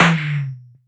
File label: shot; suika; the lost memory